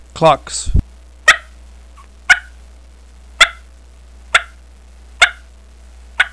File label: clucks, turkey